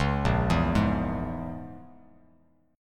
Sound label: Bb Minor 7th Flat 5th, Bass Standard; 9,1,2,1; arpeggiated; chord shape